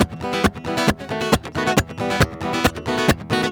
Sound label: MERENGUE, World Grooves